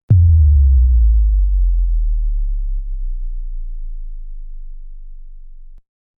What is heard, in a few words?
Deep Bass, Deep sub bass tone; Deep Tones; Sound Design